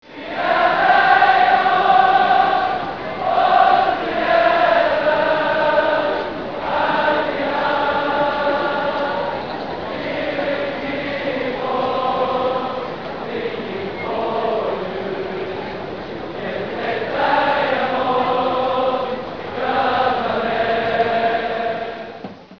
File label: chorus